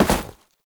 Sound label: Step Snow; Warframe; Oraxia; Foley